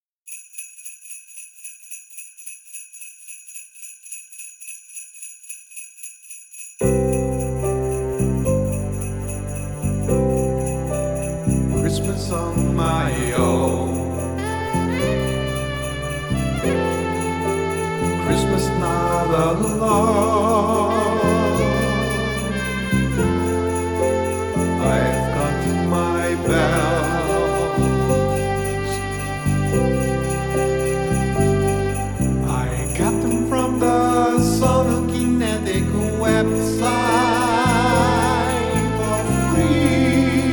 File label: sleighbells christmas